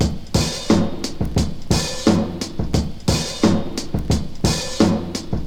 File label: 88 bpm drum beat g key; drum loop